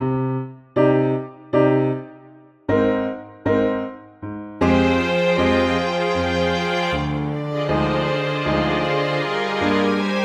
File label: quiet transformation, music for youtube shorts